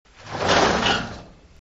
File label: door